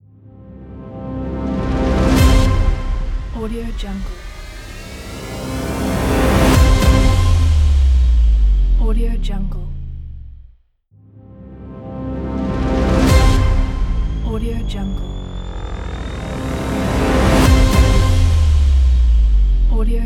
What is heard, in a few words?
epic logo; docwaxler